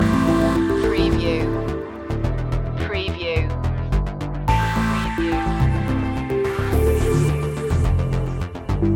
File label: loop, chill